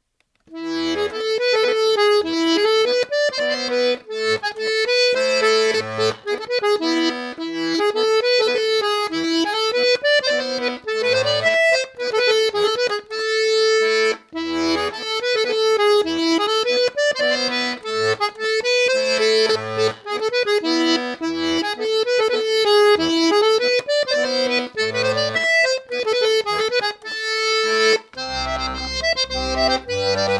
polska; sparvens polska

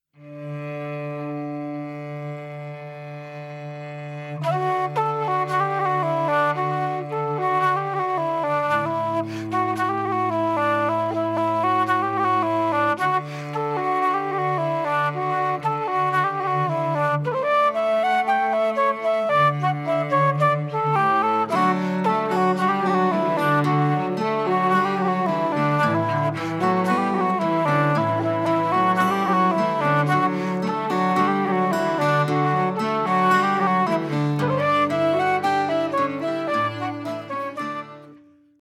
cello

